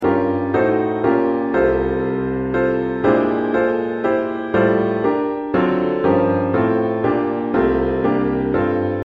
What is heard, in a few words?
Piano